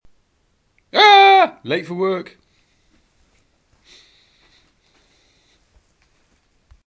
ahhhhhh

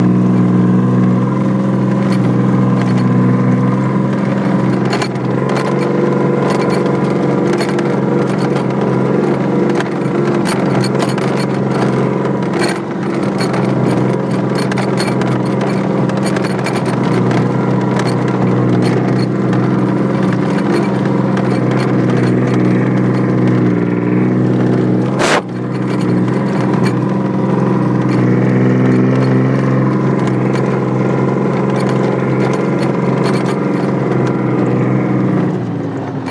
saracen tank ride

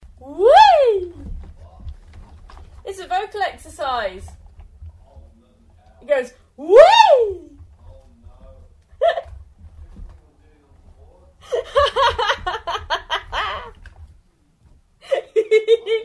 vocal exercises part of a singer's warm up; at paul's in june; vipodcasting

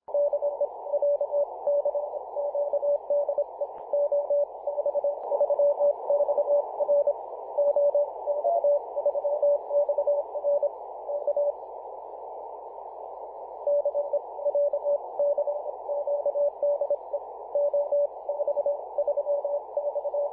21.001 MHz CW; ox3xr

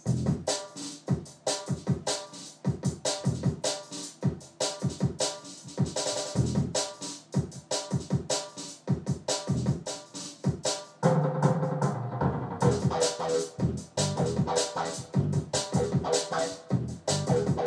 drum n bass stuff recorded on my phone

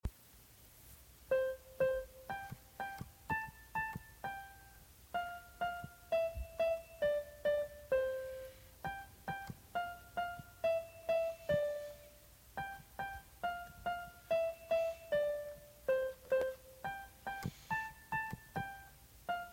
keyboard piano twinkle twinkle

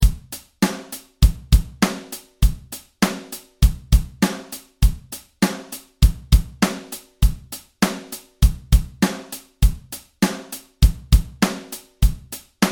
8th note drum grooves

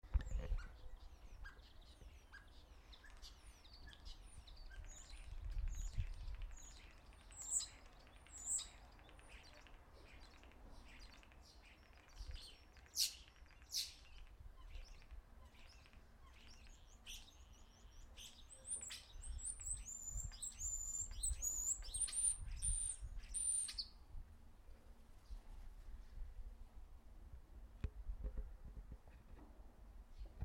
spring bird song

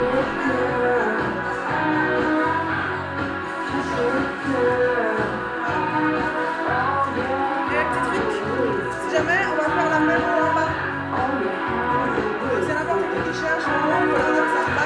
90s music; lausannebondy

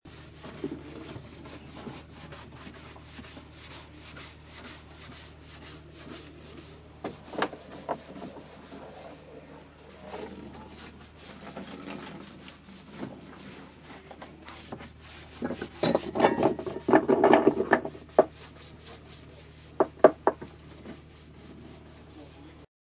washing down the deck

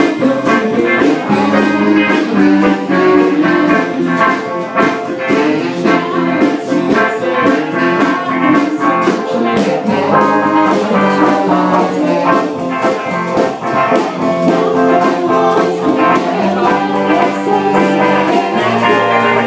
sax

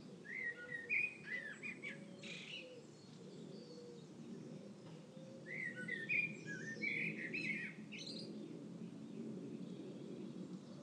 dawn chorus on easter morning

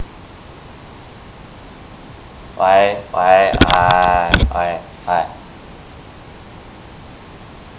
lalala

